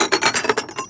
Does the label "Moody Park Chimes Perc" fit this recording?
Yes